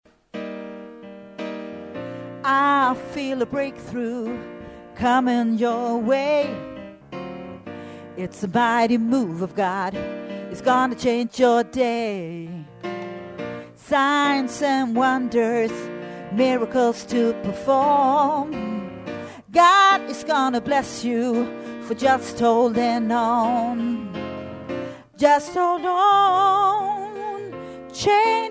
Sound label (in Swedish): a mighty move of god alt